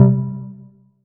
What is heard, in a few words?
Chord; ModelCycles